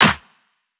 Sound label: House Clap